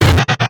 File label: corrupt; DonkeysAndDroids.Godot